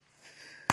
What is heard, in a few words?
Bubble Gum Blowing, bubble gum